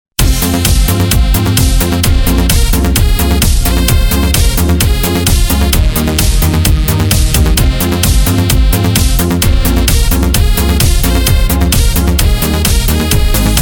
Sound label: Korg Pa3X